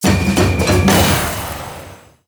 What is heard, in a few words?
Powerup Samba Burst